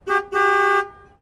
Car Horns

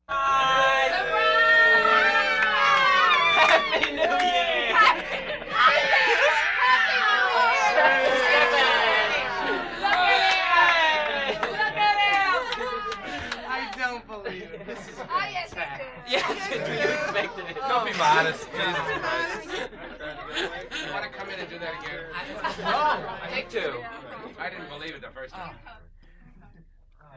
Surprise Party React, party

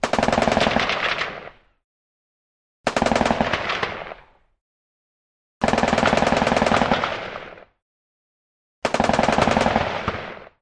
M60 Burst Short Bulle

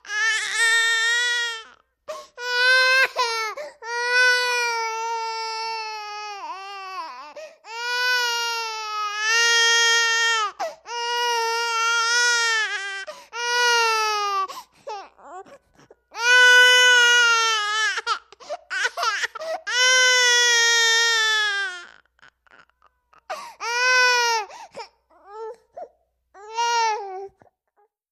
Baby: 10-month-old, Crying. Interior.; Mono

